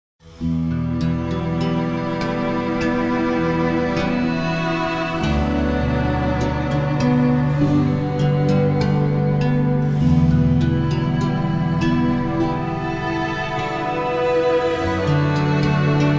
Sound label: country; dorn